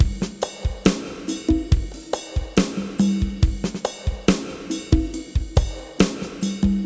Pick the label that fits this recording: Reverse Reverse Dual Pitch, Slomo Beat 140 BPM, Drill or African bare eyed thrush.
Slomo Beat 140 BPM